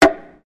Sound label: Wood panel hitting ground